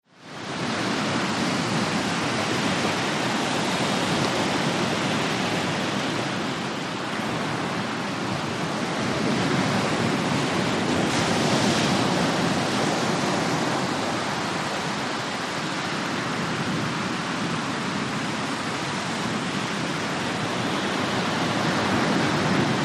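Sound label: Medium Surf Wide Beach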